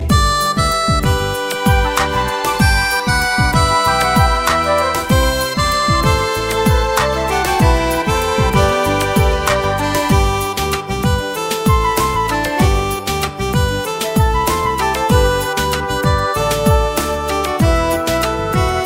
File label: Harmonica